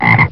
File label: animal frogs